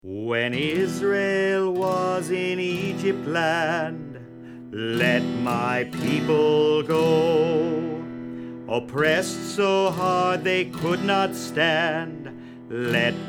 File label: Let My People Go with Guitar; passover